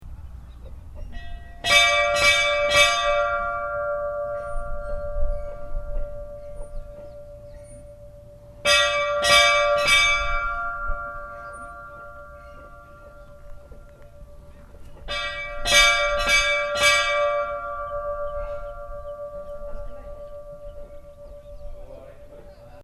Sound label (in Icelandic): budakirkja, kirkjuklukkur